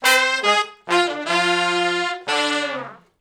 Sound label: Shuffle Blues Riff (F) unison, Horncraft for R&B Sections